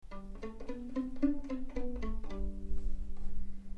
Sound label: g1 pizz, violin